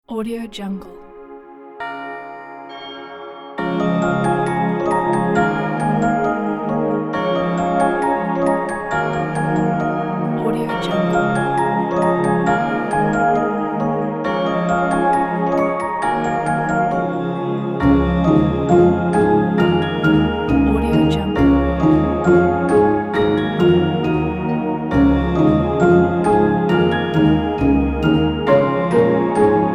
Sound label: magic christmas night